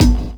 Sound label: BD, BASS DRUM, 70's Breakbeats; USB Soundscan vol.46; AKAI